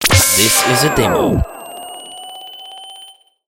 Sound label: Rising Churchbell